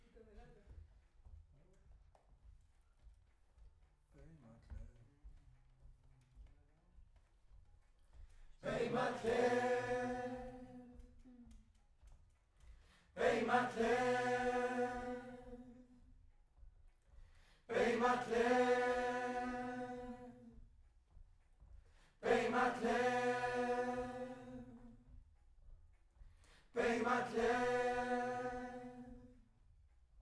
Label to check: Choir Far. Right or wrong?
Right